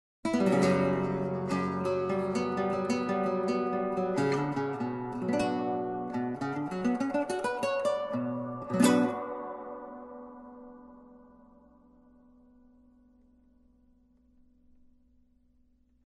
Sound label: With Reverb